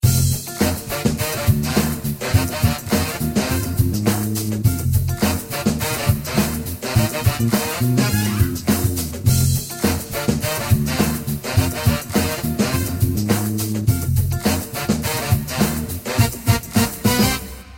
bgm menu intro

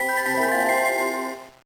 Jingle, gba

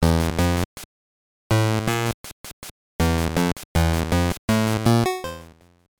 Jingle; Star Trek 25th Anniversary; gb

